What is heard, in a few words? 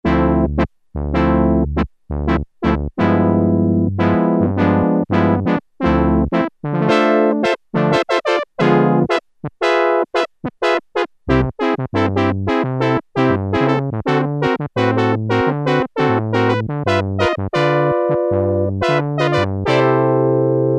Brass Ensemble; Factory Presets Bank B